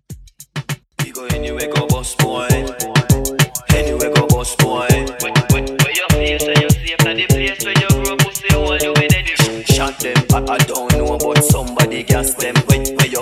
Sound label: Dance Hall Party Break 100 BPM